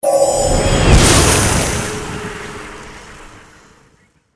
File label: spell ice down; magician